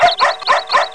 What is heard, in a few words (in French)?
son chien; Villagina; Village